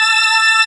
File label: SYN ORGAN, dance synth; Sound & Vision - Gigapack I CD 2 (Roland)